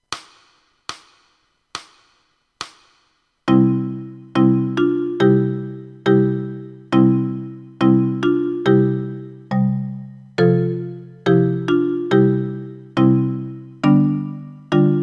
etuede in c dur, marimba mit 4 schlegeln

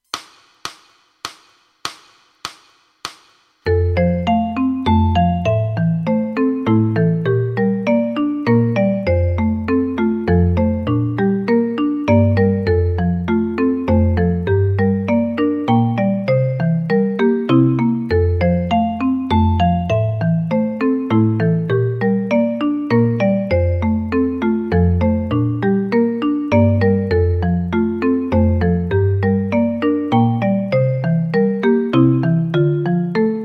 etuede in f dur, marimba mit 4 schlegeln

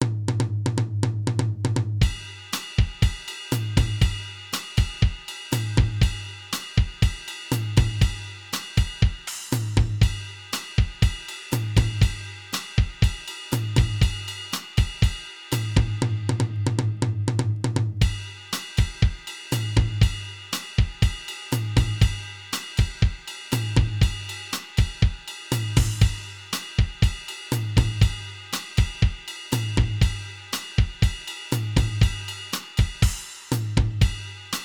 latin etuede

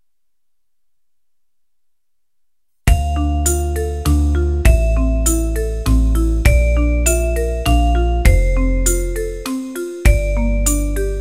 walzer 100 bpm; einfache stuecke

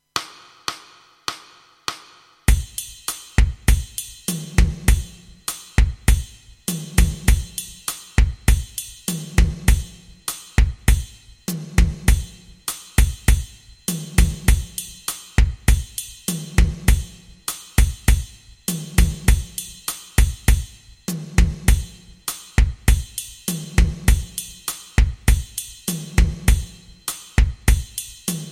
latin basic etuede

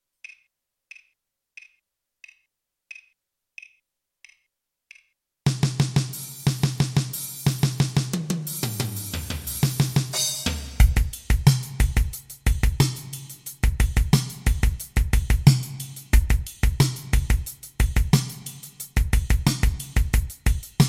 rock duett 90bpm; rock duette

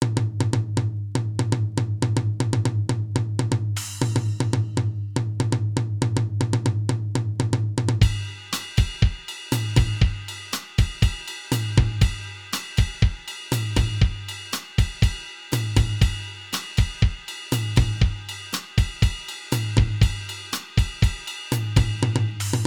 latin etuede

